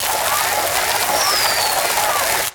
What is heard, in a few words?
Fireworks Volcano Launch; Angry Birds Rio